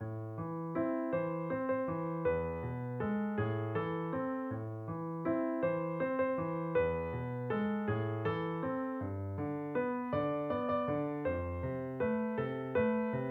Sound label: piano